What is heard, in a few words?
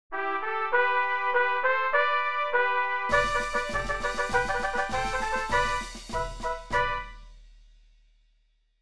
fanfare